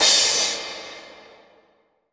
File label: Crash, Cymbals; Vengeance Samples